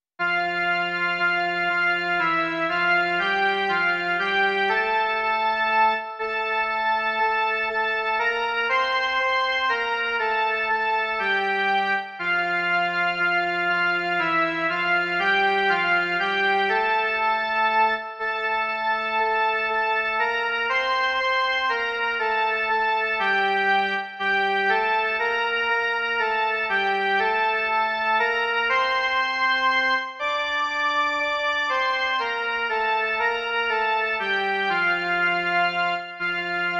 jen melodie; Hospodin, ten slavný král

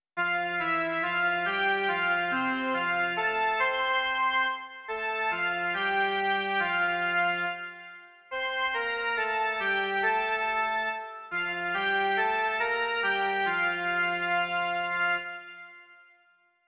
Požehnaný jen melodie, Mužská verze; Doprovody Farského liturgie

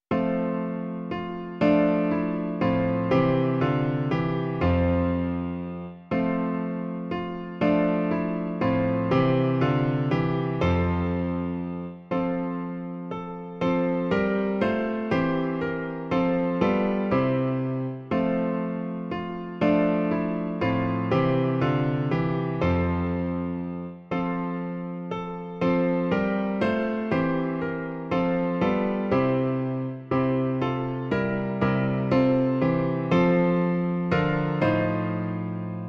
Hospodin, ten slavný král - klavír; Klavírní verze

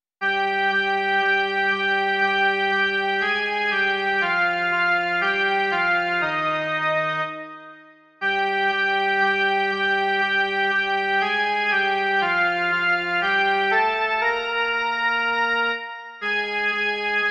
jen melodie; Slyš, kdo v tiché noční chvíli

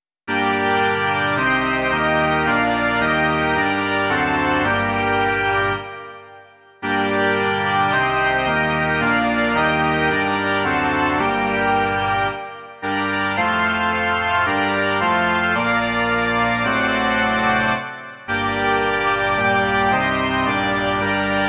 varhany; Přej, Bože, pokoje nám všem

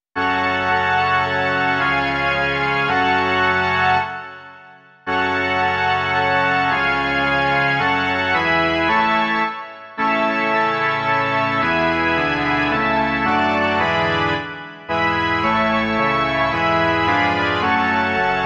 varhany; Přeslavný den, v němž Pán přišel

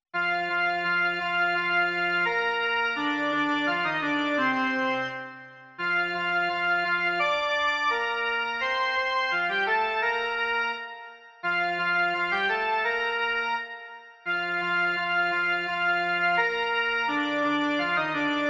jen melodie; Malé kapky vody

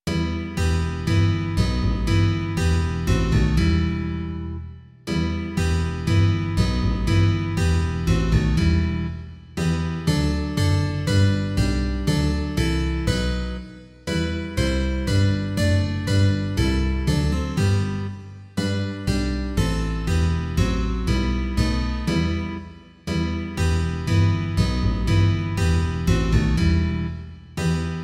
akustická kytara a kontrabas; Ó Pane, zůstaň s námi jen; Imaginativní verze

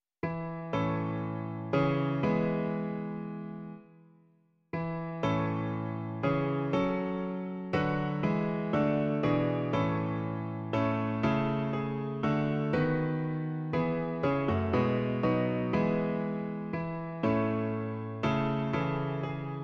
Aj, stojím u dveří - klavír; Klavírní verze

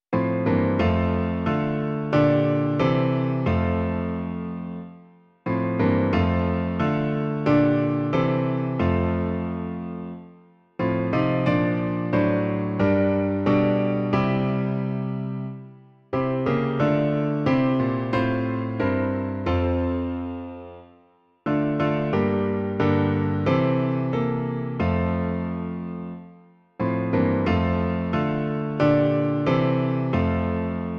klavír; Klavírní verze

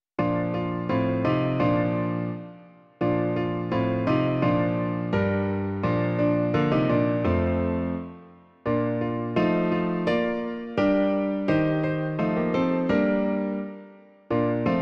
klavír; Klavírní verze

